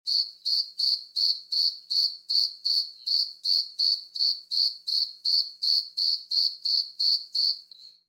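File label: night crickets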